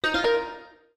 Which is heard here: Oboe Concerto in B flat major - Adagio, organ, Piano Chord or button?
button